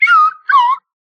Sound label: slide whistle teasing